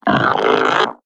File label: monster call; monsterpack2; Sonniss GDC